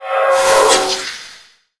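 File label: skill chain casting; QSkil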